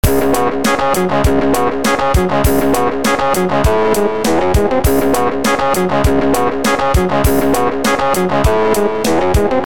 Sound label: drums example